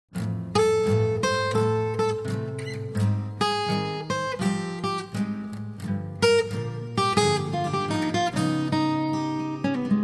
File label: steel, guitars